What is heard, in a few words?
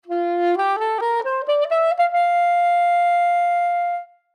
Soprano Saxophone, Soprano Saxophone F Maj Scale Ascend Hold Last Note; Brass; Musical; Musical Saxophone Soprano Scale Up Hold End; Blastwave